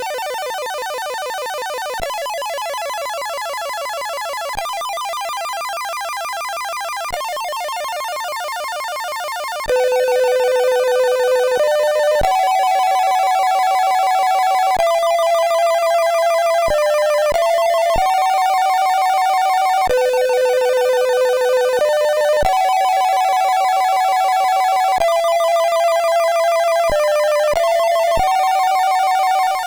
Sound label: Scream Wing, sid CSG8580R5